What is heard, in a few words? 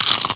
chomp; Insaniquarium; popcap